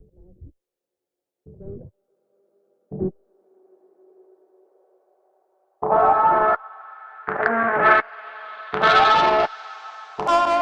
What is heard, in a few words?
m8 funky drum